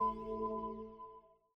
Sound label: call